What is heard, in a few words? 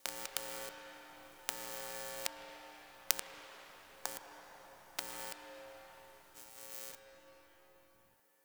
Fluorescent Light Flickering One Off; Warframe; Ambience; Entrati; Dante Unbound Leverian